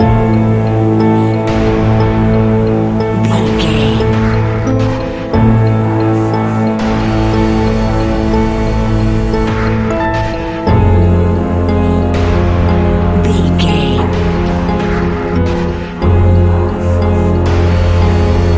modern horror

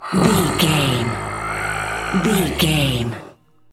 zombie voice groan

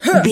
voice female effort jump action

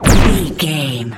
energy blast small

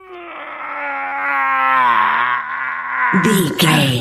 voice male effort push slow

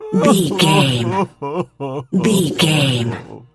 voice male cry low

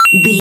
retro collect pickup coin

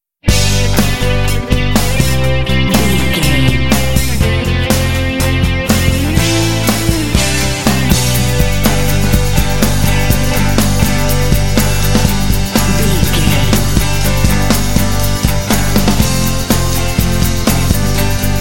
country rock jingle

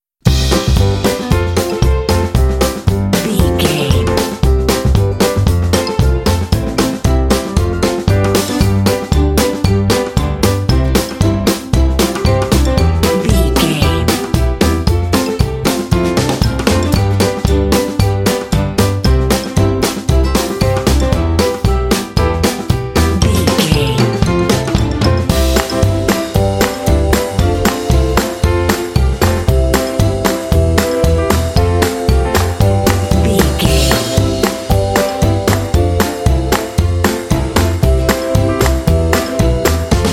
polka comedy party

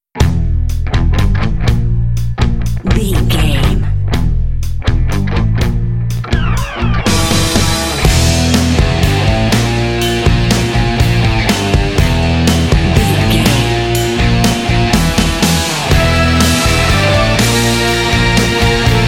raw rock and roll

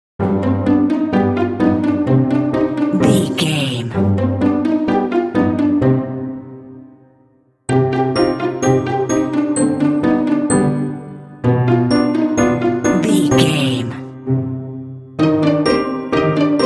pizzicatto plucking mischief cute comedy critters

